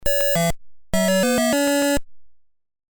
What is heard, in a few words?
Start Game; Arkanoid; ZX Spectrum